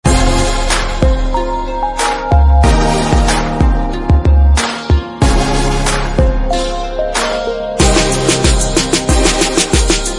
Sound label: Instrumental Ringtone